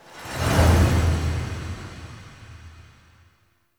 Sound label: Cinematic Transition; Warframe; Entrati Labs; Free Loid Cinematic